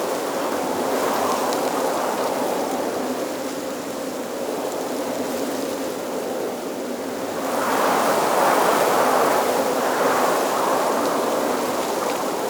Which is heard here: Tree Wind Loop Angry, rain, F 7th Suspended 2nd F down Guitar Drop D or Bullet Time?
Tree Wind Loop Angry